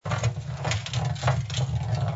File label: Roll Stone Metal